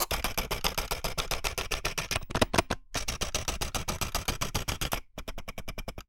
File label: Camera Sequence